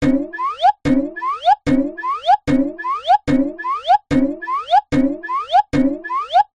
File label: cartoon bounce ascend whistle x8